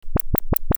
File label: loop lfo